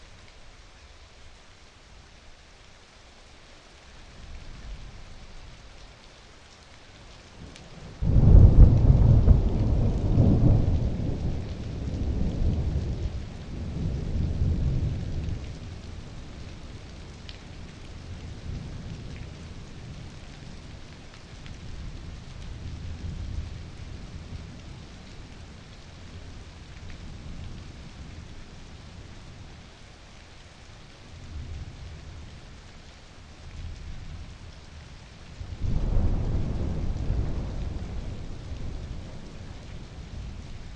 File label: Thunder Storm slow; length 1 min 48 s; slow50